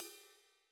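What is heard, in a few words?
cymbal 18 soft; tryThis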